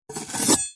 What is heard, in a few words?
warfare knife metal blade edge scrape wood